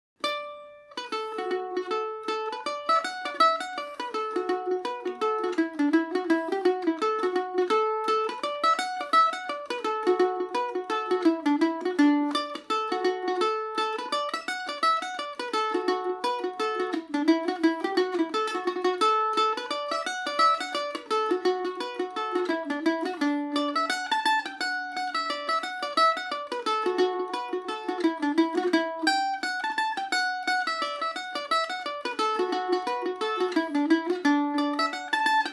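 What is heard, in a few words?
D major paris swing, irish mandolin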